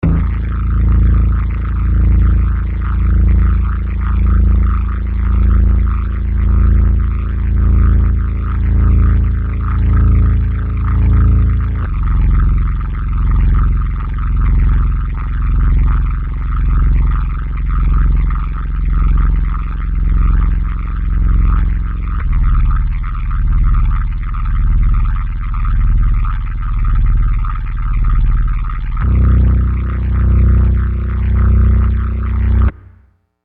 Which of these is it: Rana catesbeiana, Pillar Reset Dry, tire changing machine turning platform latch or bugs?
bugs